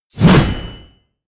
blade whoosh large

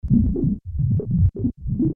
additional deep bubbling

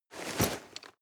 nylon leather medium short

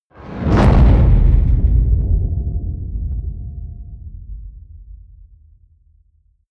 mid hollow

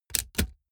typewriter

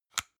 plastic hook fold in

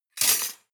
cutlery large multiple drawer place hard

